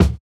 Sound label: Kick, jungle breaks drum sounds